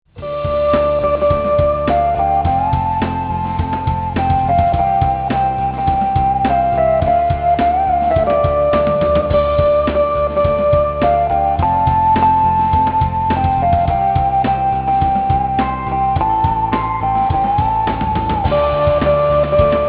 dan bau

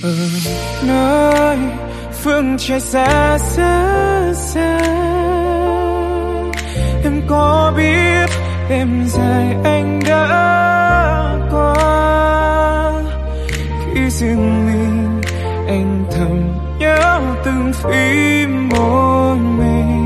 Diep Khuc

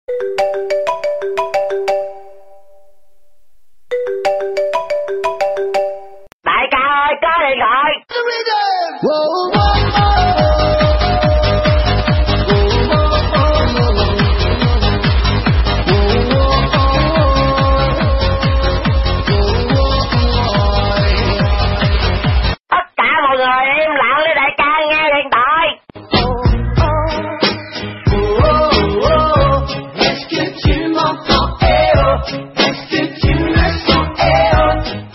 nhạc chuông iphone

